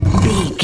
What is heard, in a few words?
rock door slide block move drag